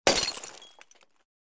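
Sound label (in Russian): разбивают стекло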